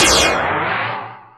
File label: FUNKIN NOISE; Greytsounds; Scott Peers Sound Engineering